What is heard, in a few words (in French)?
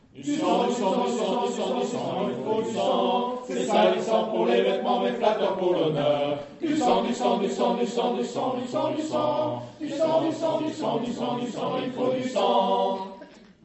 Basse + Tenor; Le Sire de Vergy